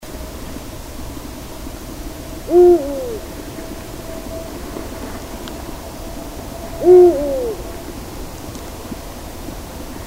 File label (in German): Uhu; Landshut LA; Susanne Rieck